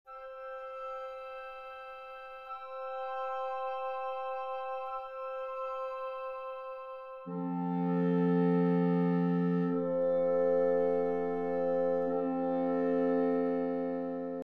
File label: woodwind; Nikolai Rimsky Korsakov